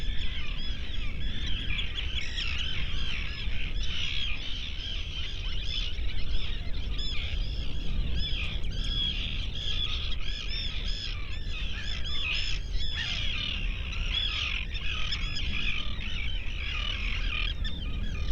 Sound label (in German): Geräuschkulisse Trischen